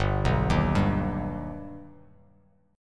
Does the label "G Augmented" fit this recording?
Yes